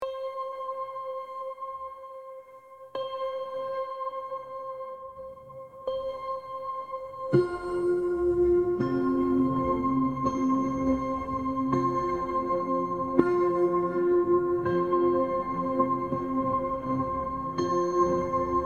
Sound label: 528 hz 741 hz 963 hz; vibrant energy for a purposeful day